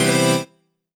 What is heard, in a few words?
CHORDS; EastWest; Dance Mega Hip Hop Electro